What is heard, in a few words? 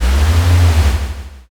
servo